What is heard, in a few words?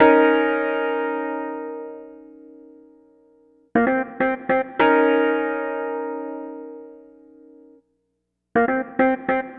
Keyboard